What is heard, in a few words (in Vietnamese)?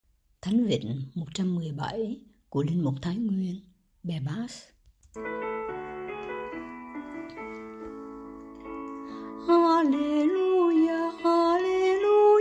Nam; Tram